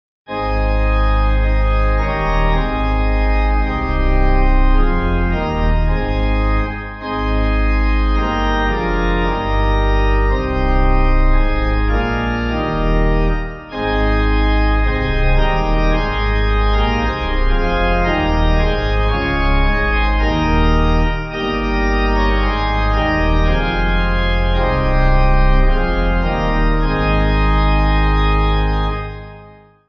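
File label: Pipe Organ; Jesus Thy Blood; Lledrod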